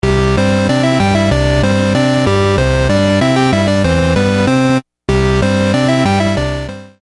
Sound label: video games ringtone